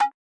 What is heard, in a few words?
nasty stormfront, Electro FX